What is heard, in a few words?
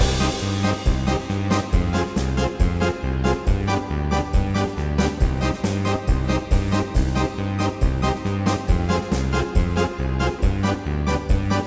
8 Bit Circus Looping; chiptunes; Windle Pixels